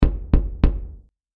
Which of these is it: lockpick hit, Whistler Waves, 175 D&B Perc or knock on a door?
knock on a door